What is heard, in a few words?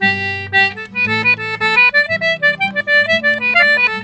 Celtic Flavours; USB Soundscan vol.42; AKAI